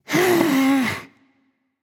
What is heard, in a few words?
sigh; smite; xingtian